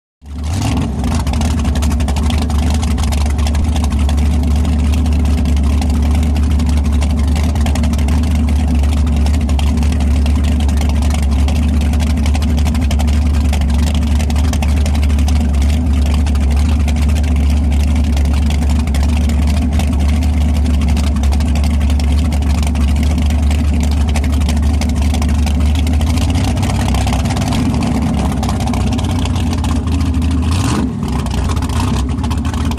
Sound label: Power Boat Idle Off C, off shore power boat